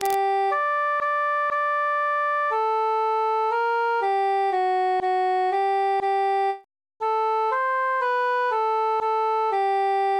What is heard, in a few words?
cantus solo; adew sweet amarillis